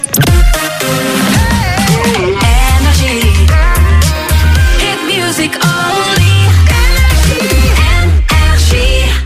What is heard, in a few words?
Jingle Rapide ID; NRJ; RAPIDE ID 2020